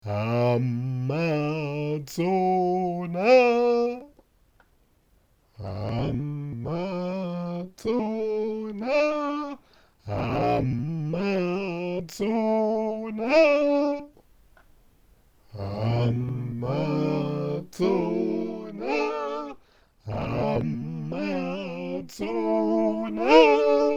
Akai MPC 2.10 Harmonizer

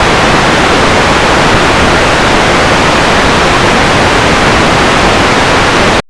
Roland Noise

